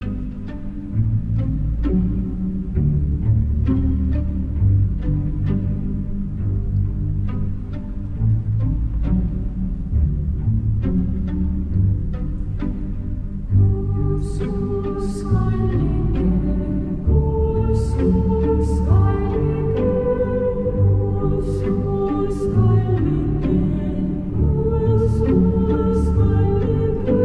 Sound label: Estonian Lullaby, for female choir and strin